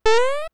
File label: jump; SNES; SMRPG